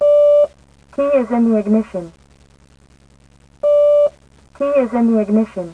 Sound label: warn; WAV Sound Effects; transpo; cars